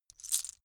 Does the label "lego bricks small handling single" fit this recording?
Yes